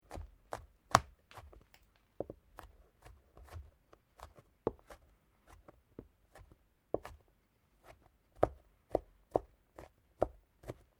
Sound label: Garlic Chop Finely Sequence; Food preperation; Restaurants